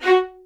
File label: LDVLN1 ATT F, LOUD VIOLINS; Q Up Arts; Denny Jaeger Master Violin Library